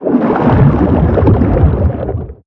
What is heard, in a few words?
creature swim fast; shadowleviathan